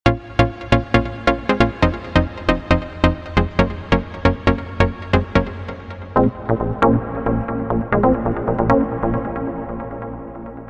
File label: Pluck sounds